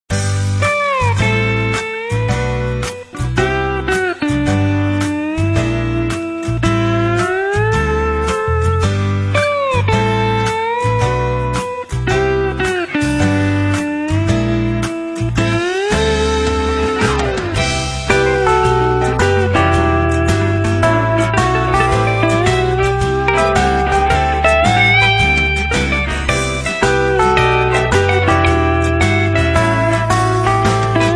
Surf The Groove, LG9600 Versa

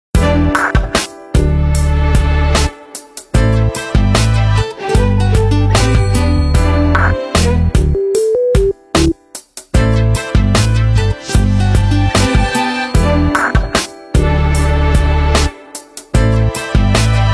Trill, Ringtones; Nokia; Asha 300

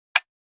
Effect Tick, ui; Fujitsu F-08D

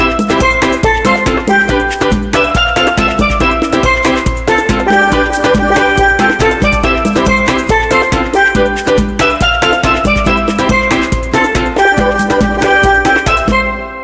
Tune Calypso; BlackBerry 9000-Bold

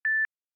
Beep once; Samsung; Galaxy Camera EK-GC100

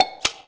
Camera Shutter

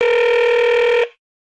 Exotic Calling, ringtones

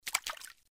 Fishing Throw Float; LG GD900 Crystal; Game Sound

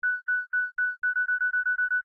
Camera Timer 2 sec; SamsungCamera

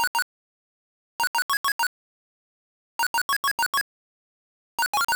ticks; Nokia; Monophonic; Tortile

